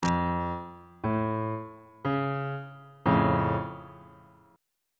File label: c sharp augmented 1st inversion on bass clef